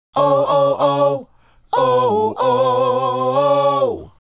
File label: Male Group, Hip-Hop and RnB Vocals